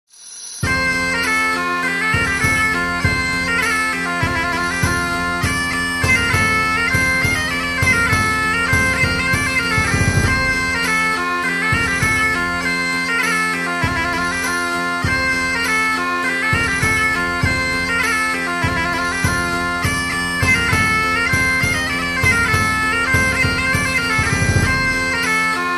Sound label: Medieval Dance